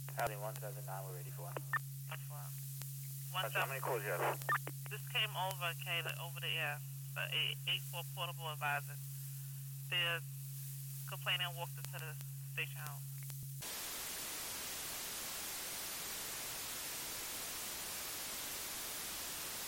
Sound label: police then switch